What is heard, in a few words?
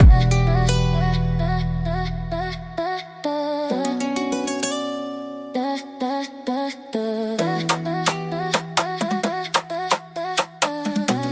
Samba in the Basement